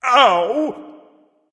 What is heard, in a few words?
ouch, voice; Smite; ymir skin12